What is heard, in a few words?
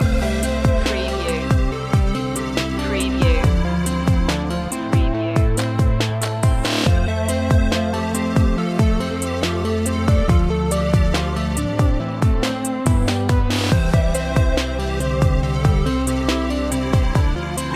robot love loop